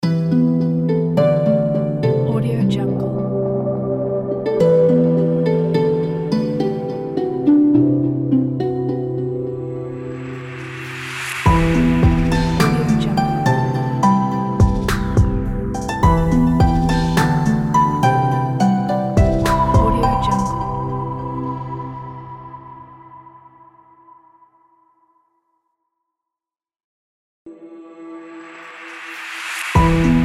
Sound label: sentimental logo